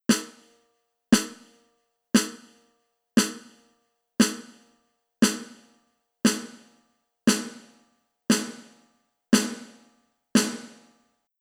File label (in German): SamplePad Pro Hall; alesis samplepad pro